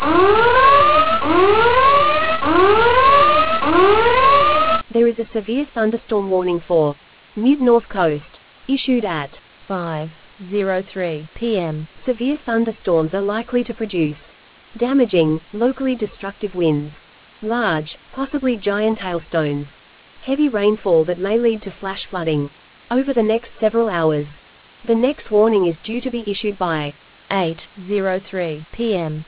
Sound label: storm audio